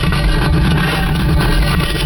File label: Scrape Large Metal Grind Loop; Doors